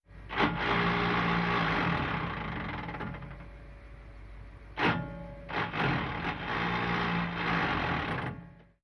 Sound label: Gear Grinds